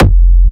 industrial techno kick oneshot - f, Nicely shaped, crispy and reverbed industrial; kick drum